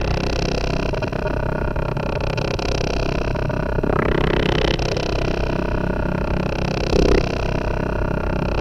Grind Drone; Sonic Foundry (Sony Creative Software); Loop Noir

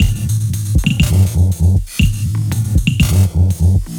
Roarer Beat; Sonic Foundry (Sony Creative Software); Myths of Technology; Composite Beats

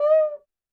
percussion, Roland TD-20

